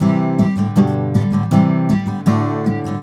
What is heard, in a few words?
GTR, Celtic Flavours; USB Soundscan vol.42; AKAI